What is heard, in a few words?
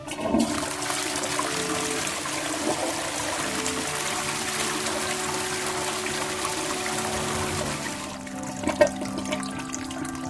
house toilet